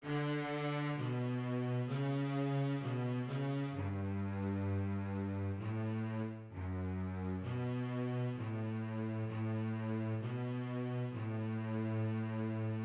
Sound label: BASS